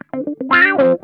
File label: WORMY, Funk Guitar; Zero G - Funk Guitar